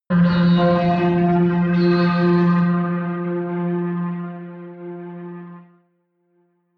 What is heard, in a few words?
synth chirp, drones